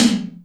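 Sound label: Hi Return, Alesis D4 drum machine tom; 300 Drum Machines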